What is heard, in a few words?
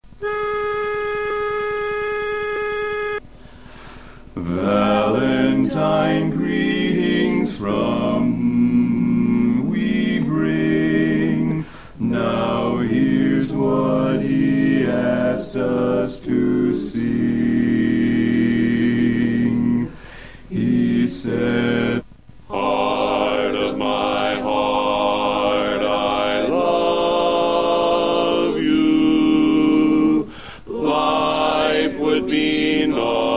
BASS Greetings, barbershop quartet